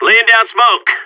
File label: smokegrenade